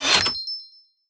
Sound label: Collectable; UnityTechnologies; StarterAsset